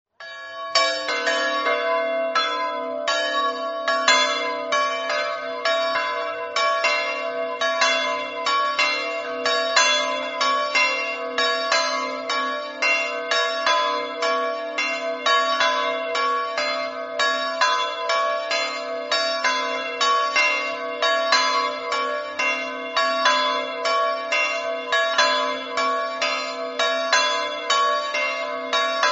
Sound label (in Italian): seufemia, campane